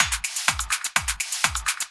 Drum loops

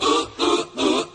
"ooh ooh ooh" SUNG; Voice

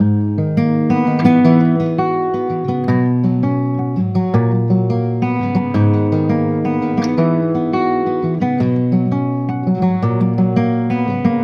guitar, Sad

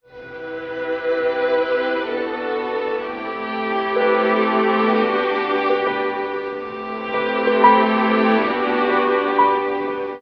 strings loses the gauge at the end, Sad

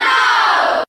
"no" crowd; Voice

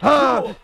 huh male, Voice

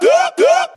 whoop whoop male; ooh ahh; ho hoo woo

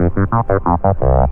bassline funk; Loops; Electronic

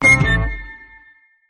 double pipes, Stabs

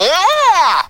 aayuh, Voice; ooh ahh

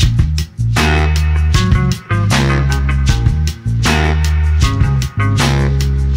full, Loops Phonky

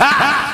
"ha ha", Voice; ooh ahh

